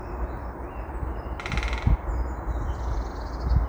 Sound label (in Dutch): specht Grote bonte specht Dendrocopos major; dieren